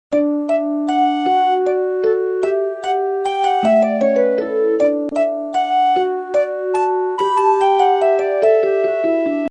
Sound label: xylo; Loops Musicais; SAPO Scratch